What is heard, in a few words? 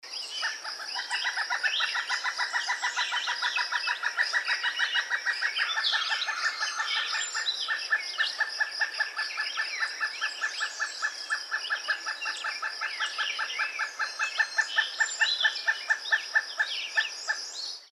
WOODPECKER PILEATED, birds; species/birds; wbba